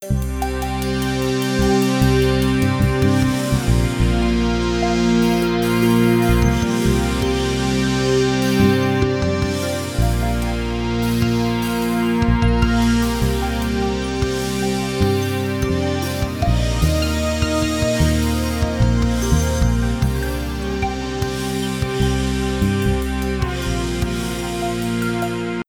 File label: dramatic music